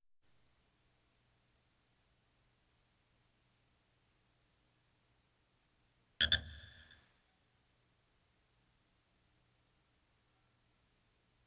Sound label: frog croaks